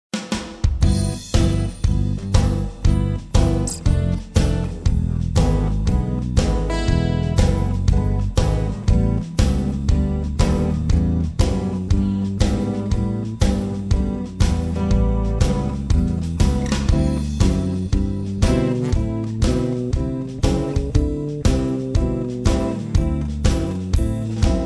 light rock

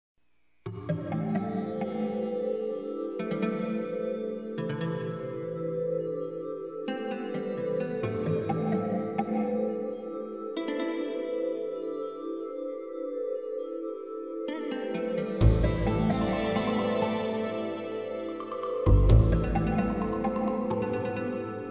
atmosphere music

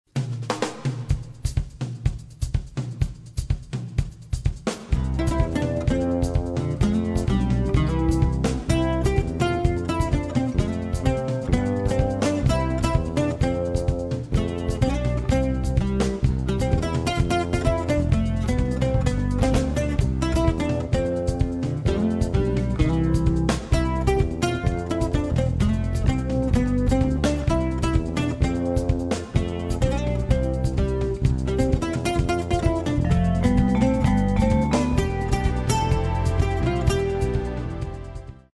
island chicken pickin

